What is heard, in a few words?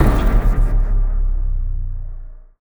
near Win End; netent